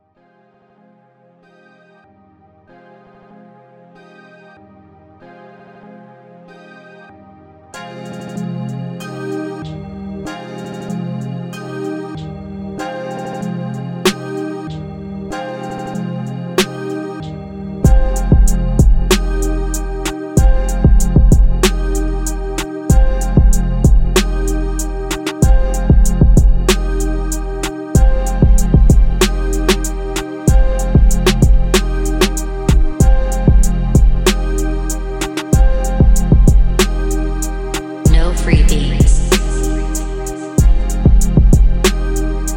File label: rain 95 bpm